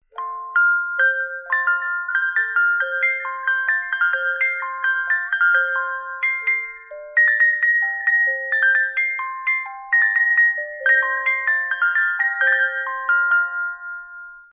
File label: Watermusic, musicbox